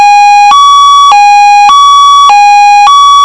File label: Hi Lo; Code3; galls